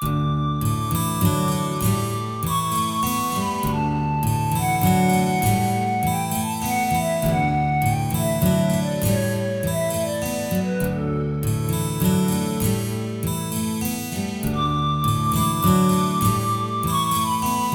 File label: happy song flute; theodyssey